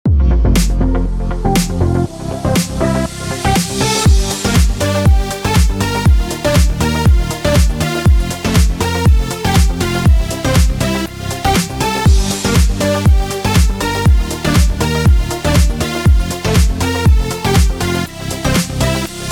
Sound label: BED POP GMIN 120BPM